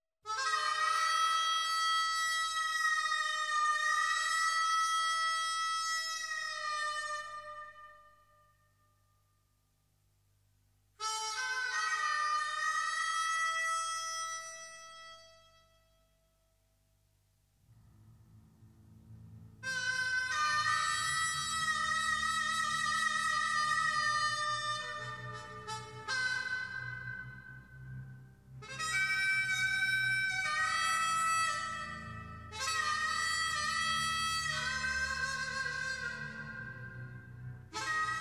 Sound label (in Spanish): armónica